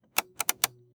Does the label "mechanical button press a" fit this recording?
Yes